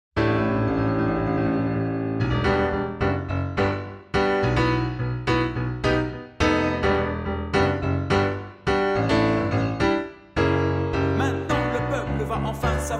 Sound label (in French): SUB ALTO